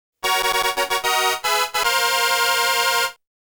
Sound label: Bonus Round Start Music; Mappy; Namco Classic Collection Vol.1; arcade